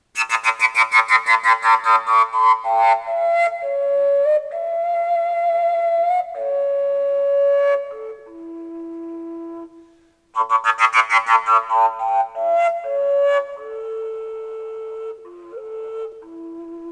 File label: a carved, fujara; folkart slovakia